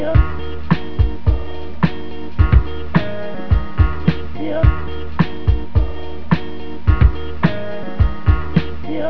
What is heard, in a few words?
phat beat